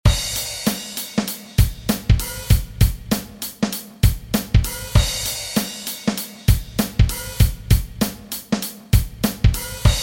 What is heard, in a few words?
drum grooves